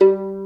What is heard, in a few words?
Violin Pizz G2; Instruments & Percussions; InVision Interactive; Lightware VOL-1